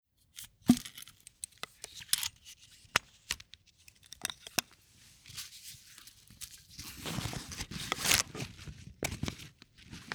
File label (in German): faltbare Treckingstoecke zusammenfalten einpacken